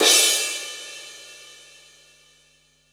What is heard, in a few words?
Cymbals crash; Vengeance Samples